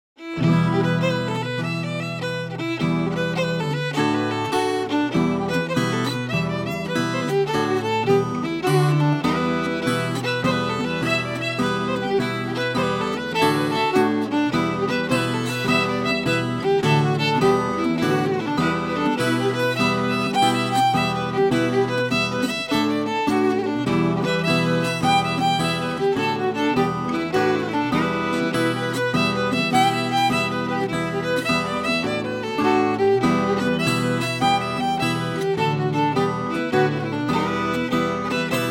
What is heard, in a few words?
Bagpipes; Gettysburg